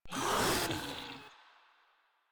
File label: Infested Ancient Vocal Pain; Warframe; Proto Infested